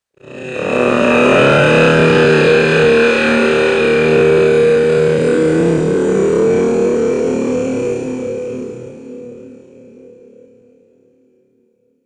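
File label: Alien Disintegrates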